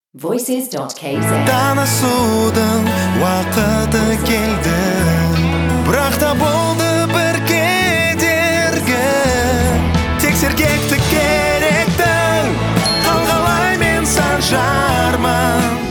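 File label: Kazakh vocal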